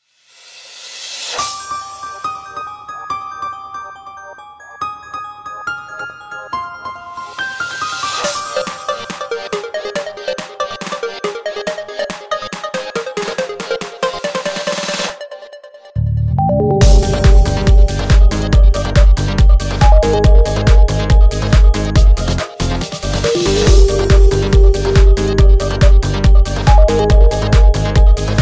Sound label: Pop Trance